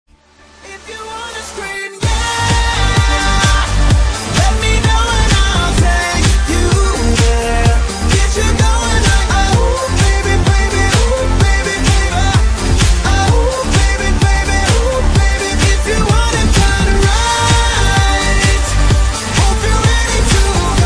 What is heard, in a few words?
scream